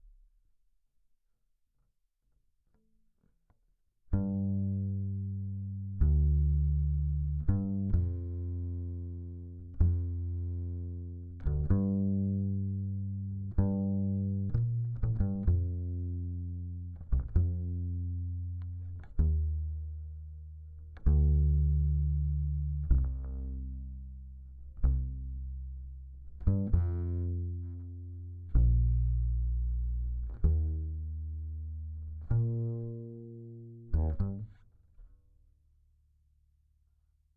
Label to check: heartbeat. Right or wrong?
Wrong